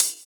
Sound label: Hhat, HiHat; Equipped Music; Loungin House